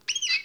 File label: coast bird; Half Life Model Pack; Half Life 2; ambient levels